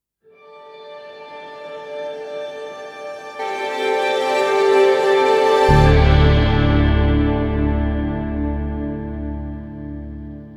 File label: Stinger; Killer House